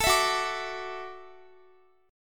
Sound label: Gb Minor 7th Sharp 5th, Guitar; Gbm7#5; strummed; x,x,19,21,18,21